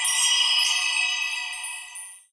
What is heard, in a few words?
Pickup Magic Bells; SFX Kit; Pickups